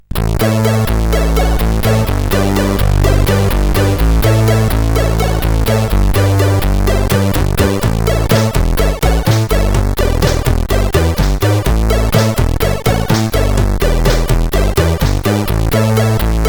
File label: Not Funk Yeah, CSG8580R5